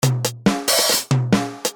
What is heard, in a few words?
Drums; Jazz Funk